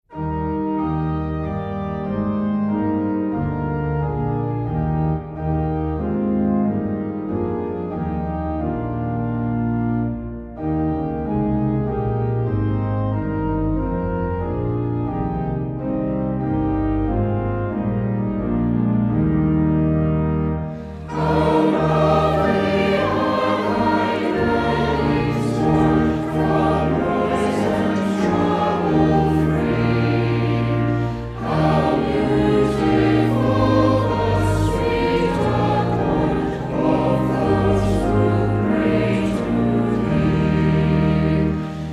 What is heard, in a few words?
hymn